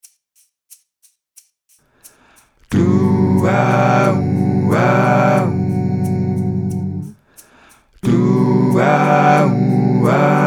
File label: Maj7 tutti